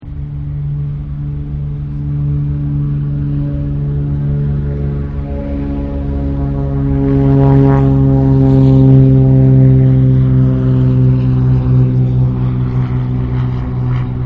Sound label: Plane speedby, Plane Speeds By With Engine Rumbling; Airplanes; Vehicles; Michael Huang